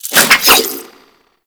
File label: Whip Spin; Grineer